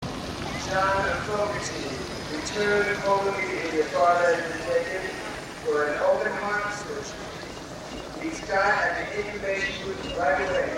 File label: Announcement Audience Rain Chant; 1969 - Woodstock